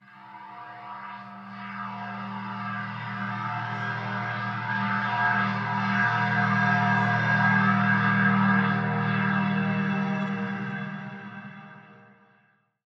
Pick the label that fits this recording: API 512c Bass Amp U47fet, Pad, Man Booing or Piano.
Pad